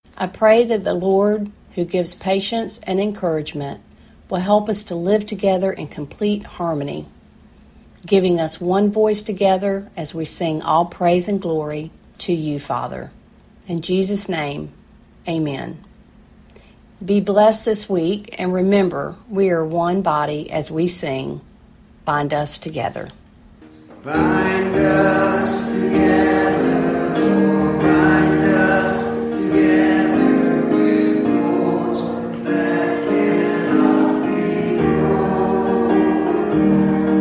Benediction and Closing Chorus

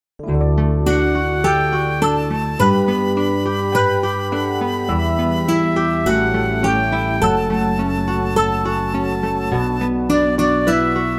Guitar; Closing Hymn; Fifth Sunday after Epiphany; Bethel

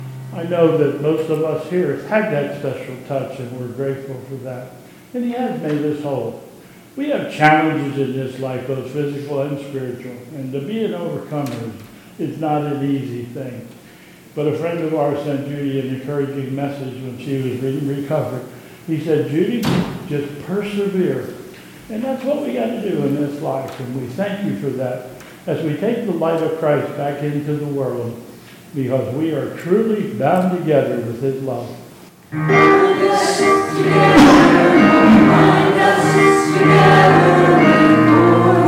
Benediction and Closing Chorus

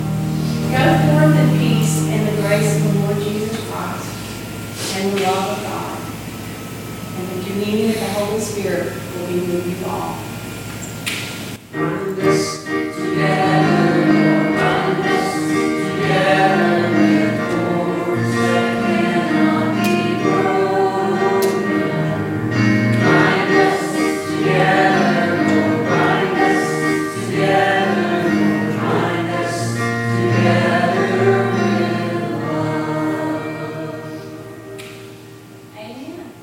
Benediction and; Covid-Time

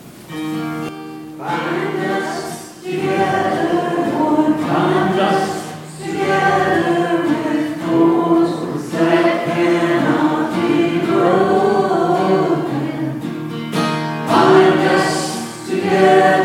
Closing Chorus; church service; Bethel UMC

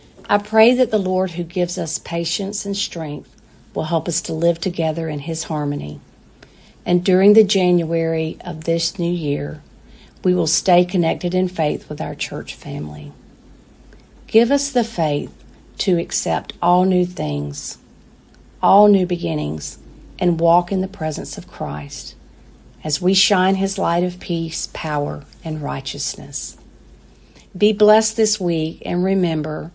Benediction and Closing Chorus

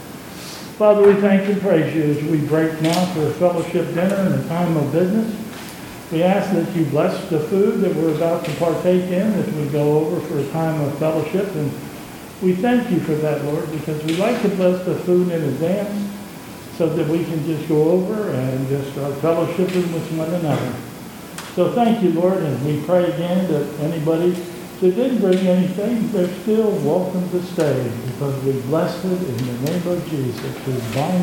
Closing Prayer and Chorus

